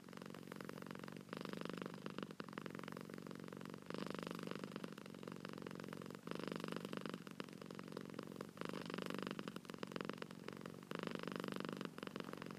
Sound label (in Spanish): ronroneo de gato